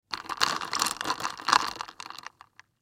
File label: Drink Stir With Ice; Drink Mixing; Foley; Blastwave